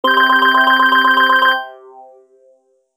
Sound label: MELODY